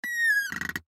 Microphone Stand, Microphone Stand Movement Short Squeak; Foley; Blastwave